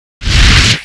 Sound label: FIRE; shinkiro shiranui; SS FOUR CHARM